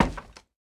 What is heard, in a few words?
ladder, step; blocks